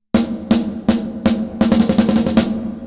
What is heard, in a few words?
EQUALISER Roll off 500Hz